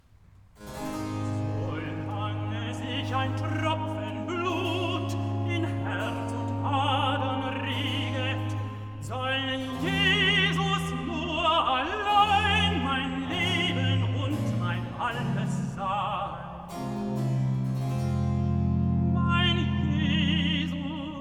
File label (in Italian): Recitativo (Tenor); Cantata, BWV 124 'Meinen Jesum lass ich nicht'; Bach; Hamburg; 1st Sun After Epiphany